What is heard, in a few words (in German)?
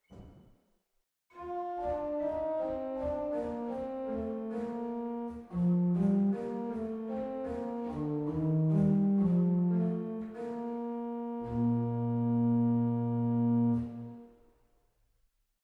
Celinder Qvinta 6, organ; waltershausen